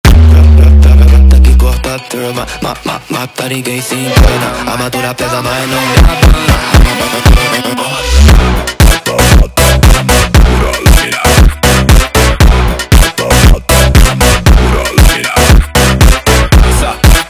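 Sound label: sped Up, phonk music; funk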